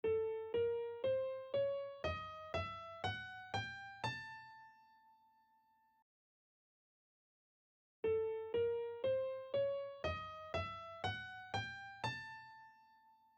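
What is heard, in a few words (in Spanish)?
escala simétrica disminuida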